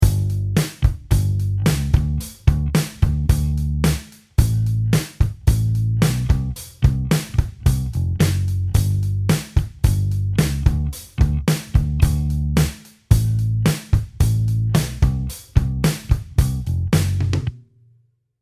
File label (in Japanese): ベースライン 経過音